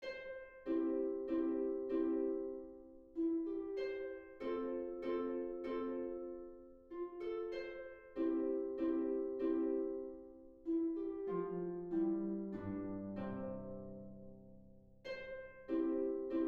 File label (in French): menuet majeur